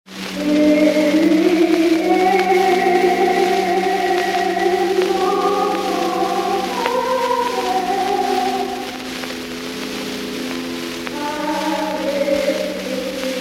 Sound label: Original Singing In Church On Easter